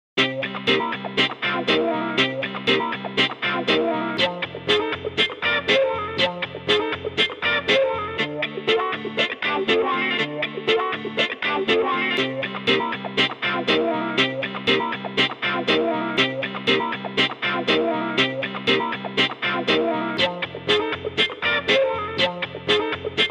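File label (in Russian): muzika disko, disco music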